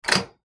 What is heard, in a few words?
Stove Knob Turn Off, Stove Knob Turn Off Antique; Historical; Appliance; Household; Blastwave